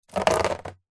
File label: nature water drips sudden on card folder binder